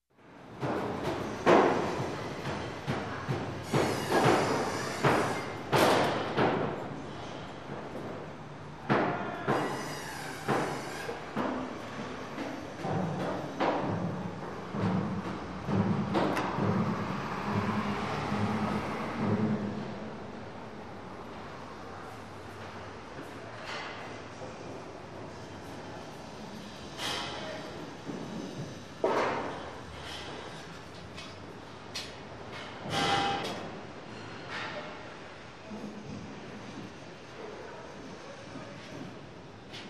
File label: Construction Site Ambience with Metal Bangs